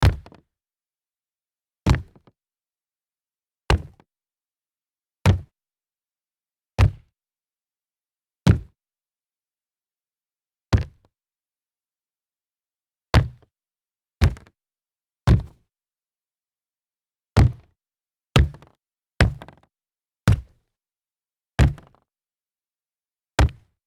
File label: sneaker large hollow wood